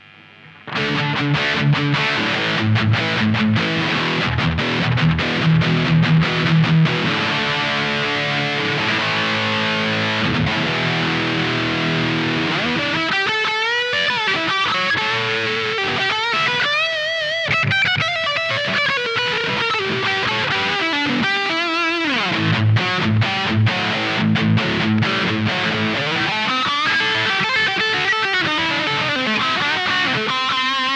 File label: Higain Preamp; preamplis en rack; Preamp 4